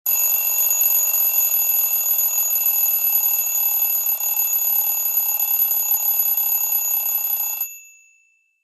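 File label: alarm classic, sony ericsson phone sounds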